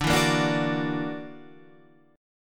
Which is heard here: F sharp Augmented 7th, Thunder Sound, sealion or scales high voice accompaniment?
F sharp Augmented 7th